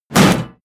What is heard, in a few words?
hit armor metal